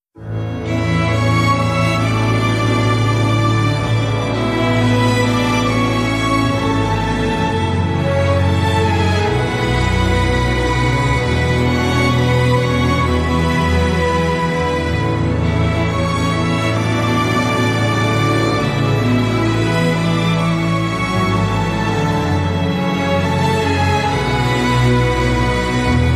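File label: Orchestra Solo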